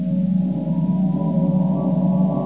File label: spaceship